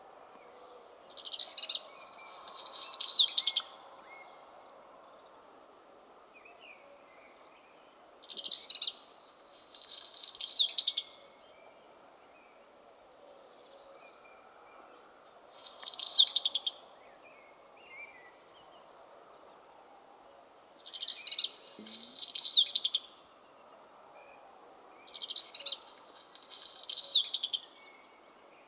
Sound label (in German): Hausrotschwanz - Phoenicurus ochruros